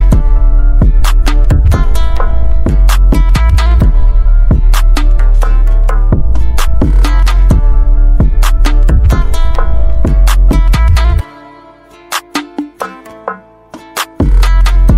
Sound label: spanish guitar